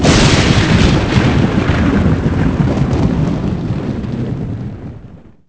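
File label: thunder close; envrn; The Elder Scrolls III Morrowind GOTY; XBOX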